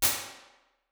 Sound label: Fake Reverse, Medium Rooms, IR Samples; Convolution; NI Kontakt 5